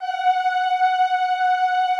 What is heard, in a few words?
EEH F#4, Extended Classical Choir; Best Service